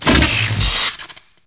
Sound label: electr explo; MOTS